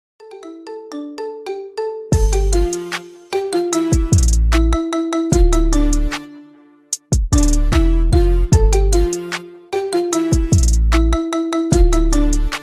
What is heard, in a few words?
iphone marimba ringtone